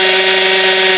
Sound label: Power; gp500